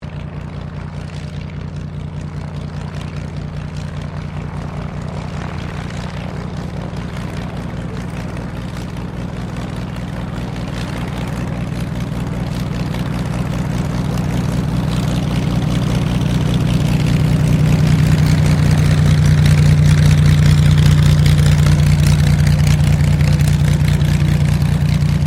AIRCRAFT CAC CA-25 WINJEEL SHUTDOWN, fighter trainer; RAAF Winjeel fighter trainer; Airplanes; Vehicles